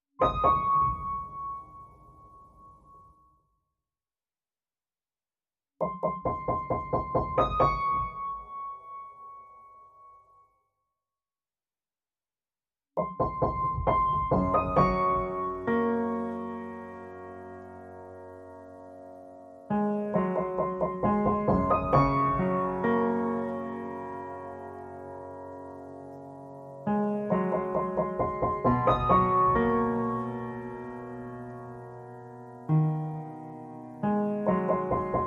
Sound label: Top Line Pianos Only Mix